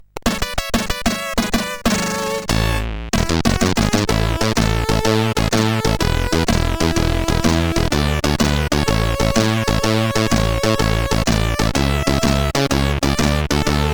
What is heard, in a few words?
Hip Pop, CSG8580